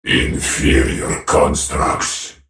attack; Viktor; Character Voices - English; en US

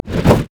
ancient golem basic attack, monsters; LoL

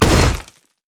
steam golem basic attack; LoL; blitzcrank

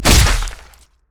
basic attack knee; rengar

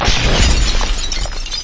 detonate; LoL; varus skin03

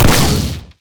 explosive shot; rocket tristana

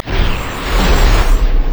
charge; LoL; xerath base

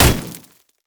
axe throw land; olaf

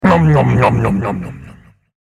ultimate effort; dandychogath; Character Voices - English

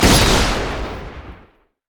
cluster shot; LoL; graves

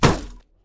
yeti vs wood; nunu

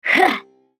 effort; BadRobotAmumu

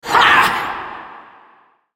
spellcast effort; Syndra

